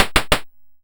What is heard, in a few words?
YM Perc, DRUMS; ChipShop Deluxe; ChipShop Hits